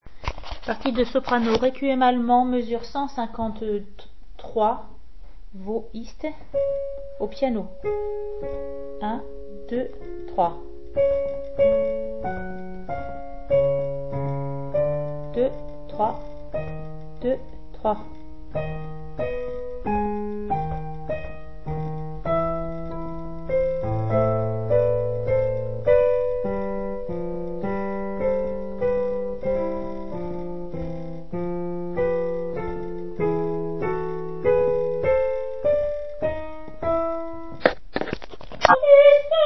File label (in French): Brahms requiem allemand soprano mesure 153-167 piano solo; mesures 153 à 167 piano solo